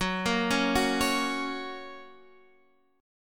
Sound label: F sharp Major; 14,13,11,11,14,x; arpeggiated